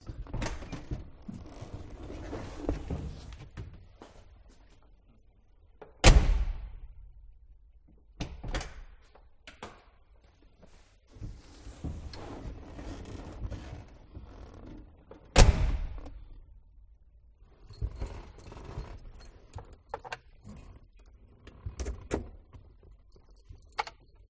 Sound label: INT driver out in ignition key; detunized